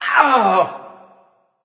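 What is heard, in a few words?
non verbal ouch, voice; cernunnos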